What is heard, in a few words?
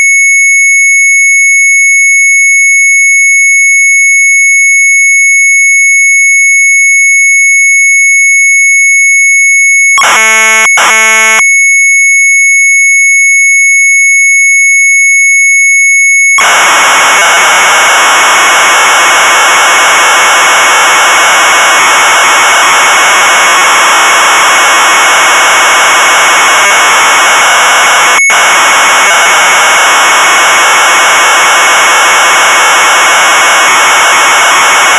MZ800 Tape, sharp; WEERSTAND CODE; retromelsarchive